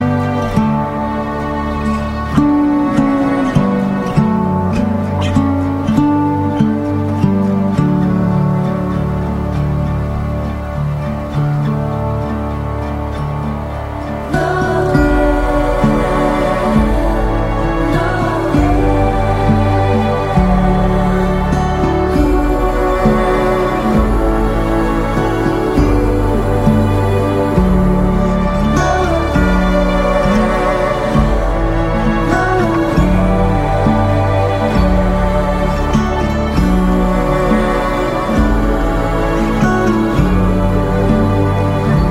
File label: first noel instrumental